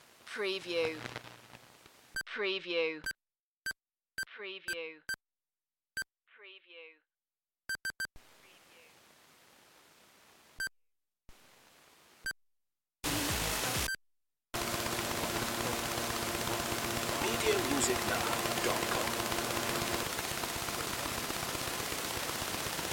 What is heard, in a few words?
Sony Walkman Static and Digital Tuner